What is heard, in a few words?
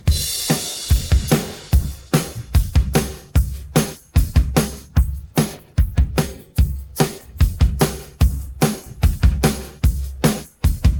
pattern, drums